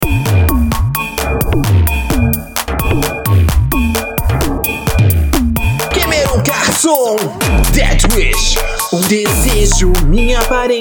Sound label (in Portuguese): versão em português